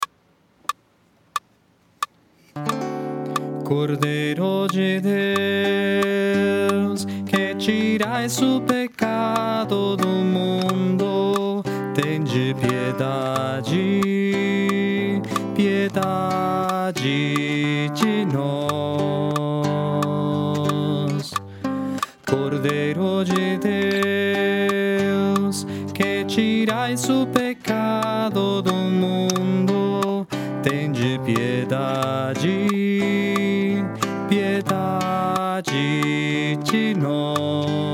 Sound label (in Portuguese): Cordeiro de Deus Barítono